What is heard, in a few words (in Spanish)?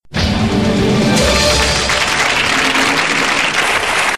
acierto; 50x15